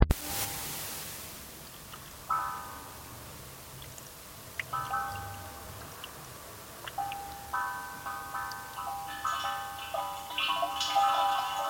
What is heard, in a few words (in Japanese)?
suikinkutsu